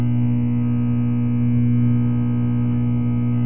power light

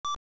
Low Health; zelda

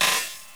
shock

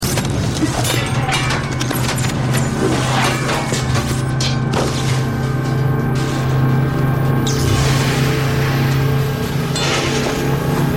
spinup full

